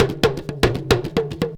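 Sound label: big tama groove; Best Service; World Colours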